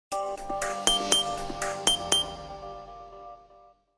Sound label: Nokia Default Messeg, Ringtone